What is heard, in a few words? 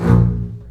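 basses A#1 marcato short; East West - The Ultimate String Collection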